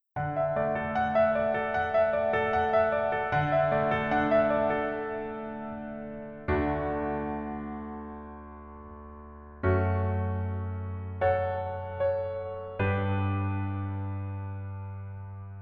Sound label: Piano ver; 꼰대 인턴